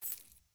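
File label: environment building sold coin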